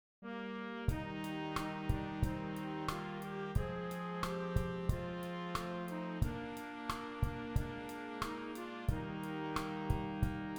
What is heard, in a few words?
perc